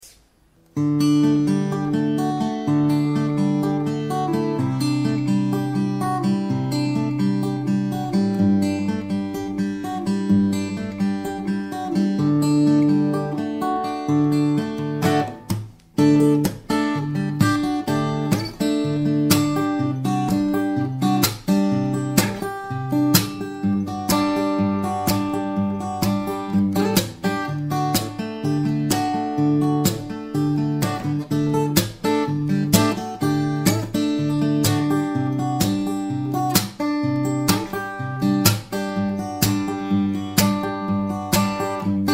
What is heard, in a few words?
fingerstyle, guitar